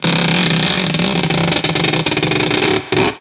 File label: Noisy Creaks